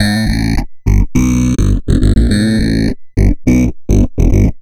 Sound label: Bit Reduced Bass, Electric Bass; Sonic Foundry (Sony Creative Software); Leo Cavallo Bass Taster